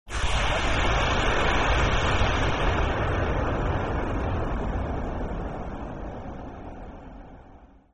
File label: Thunder